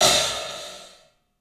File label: club hat; 3D Sound; 3D Drums